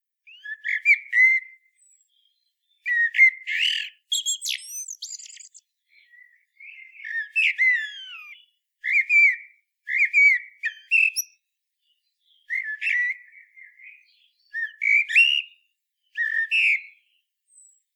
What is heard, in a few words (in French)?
chant merle noir; oiseaux